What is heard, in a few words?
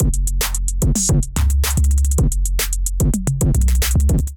Durty Kit groove 110 bpm; MIDI Clips; Ableton Factory Packs; Beat Tools